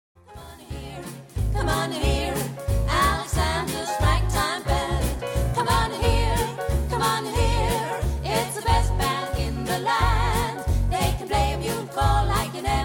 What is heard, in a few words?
Swing